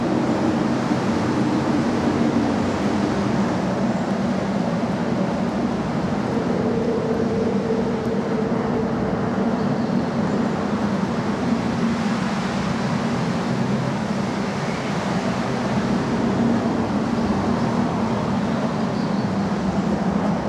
ambience snowstorm

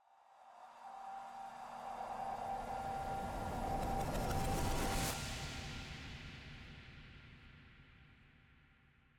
Transition Swoosh; gamerip; terra invicta

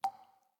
Cave Random Drips; The Inn

